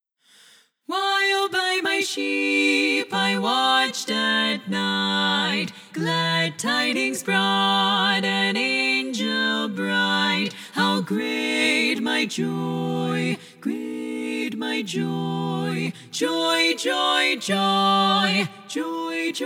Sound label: While By My Sheep (SSAA) - LEAD Sing Along